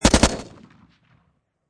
Machine Gun Sig Sg 552 Commando Short Burst Close Perspective; LYN084 Guns